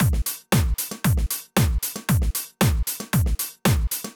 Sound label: Drumloop 115bpm, Nu Disco; Zero-G